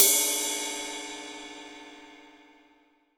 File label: 18 OLD, 18INCH OLD ZIL RIDE